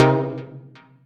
Synth Lead; Minor Crossroads

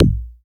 Tom; Ableton; Foundation Drums; FMD HandyTom

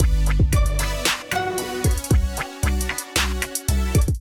Azimuth Kit groove 114 bpm; MIDI Clips; Ableton Factory Packs; Beat Tools

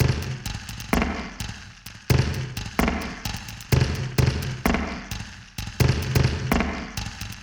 Bathtub & Ice Groove 129 bpm; MIDI Clips; Drums; Ableton; Factory Packs; Lost and Found

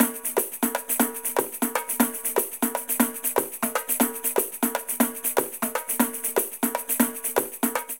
Baion B Tambourim Pandeiro 120bpm; Latin Percussion; Ableton; Factory Packs

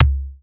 HX Kick; Drums; Ableton Foundation FreePacks

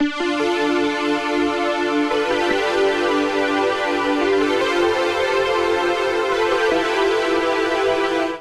Air Space Pad C Major 114 bpm; MIDI Clips; Tonal; Accompaniment; Drive and Glow; Ableton; Factory Packs

